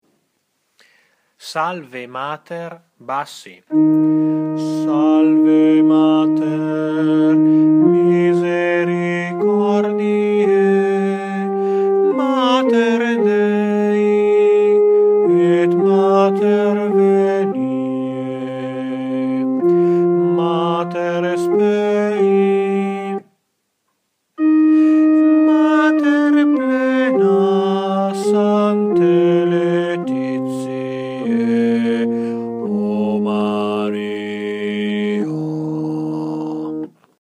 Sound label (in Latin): Salve Mater BASSI